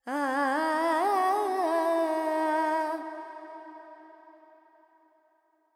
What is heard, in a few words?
FX, Vocals, Carnatic Female, Alaps, Charukesi